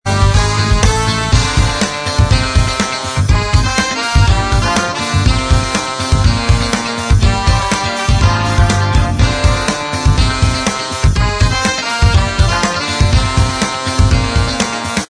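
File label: instrumental, backing tracks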